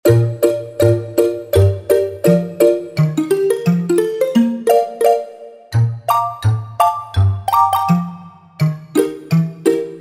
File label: Glass Tambu, Korg Wavestation A-D